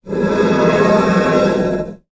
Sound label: stone slab scrape; foley